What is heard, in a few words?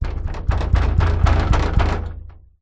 impacts hits thumps on loose metal railing pole holding glass